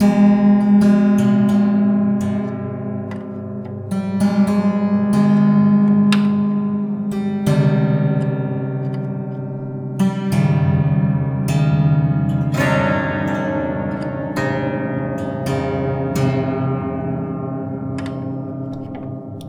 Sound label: Detune, Piano; Piano Destruction